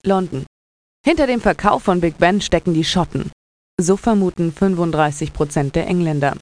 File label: Big Ben; holiday